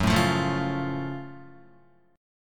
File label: Gb Minor Major 7th Gb down Guitar; strummed; JGuitar